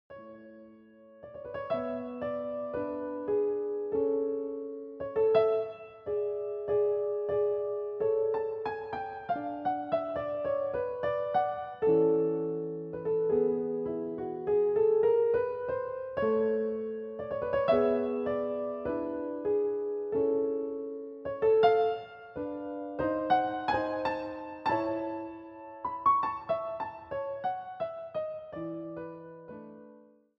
Piano Sonata; No 17 in D K576 Second Movement Adagio; Mozart

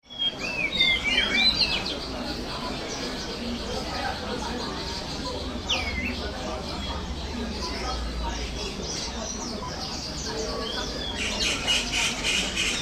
Hong Kong Bird Market Ambience; Yuen Po Street Bird Market Ambience, Hong Kong

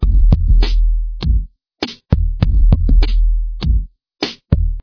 HeatBeats Break 100bpm

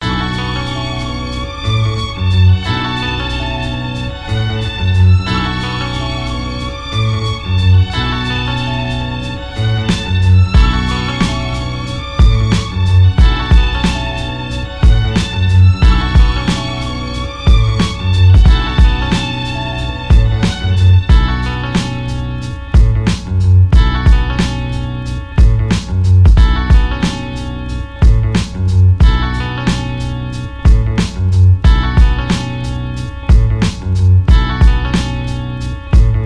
beat

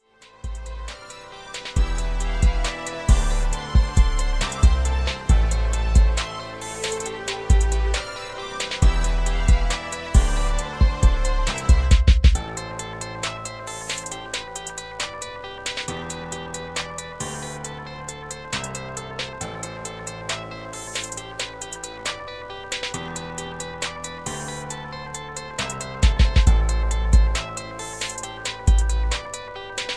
68BPM

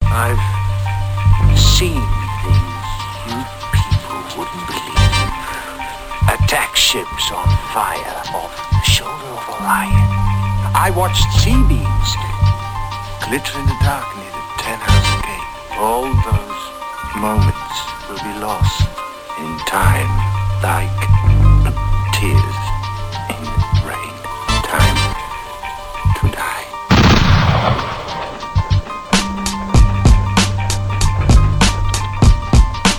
beat

